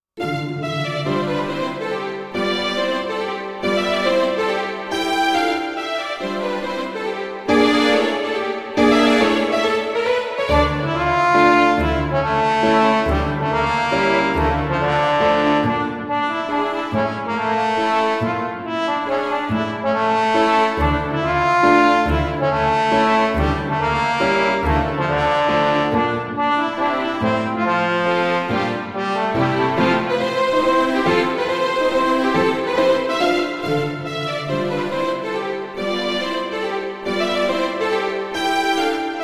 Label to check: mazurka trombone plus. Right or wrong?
Right